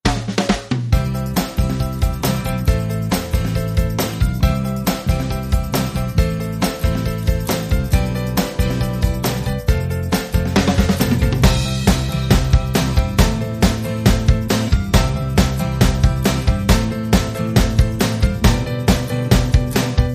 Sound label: Girl Group, No Vocals